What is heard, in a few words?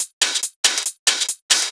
Loops 140 BPM Hihat Percussion Loops